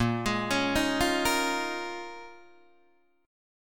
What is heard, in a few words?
Bb Minor 11th Bb down Guitar Open D